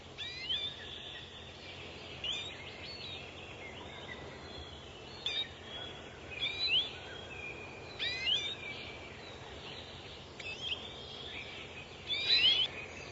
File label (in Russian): chizh